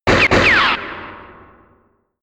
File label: fire two burst, Pistolen und Gewehre